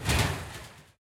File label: retrigger Free Spin; wild wild west